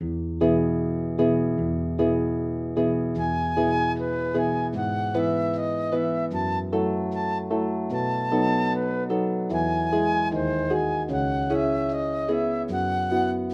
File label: Flute and Harp; salut damour